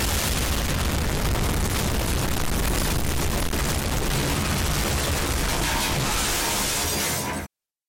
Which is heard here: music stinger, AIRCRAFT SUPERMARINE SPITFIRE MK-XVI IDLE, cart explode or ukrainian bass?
cart explode